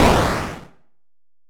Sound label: explosion; Kayne Ruse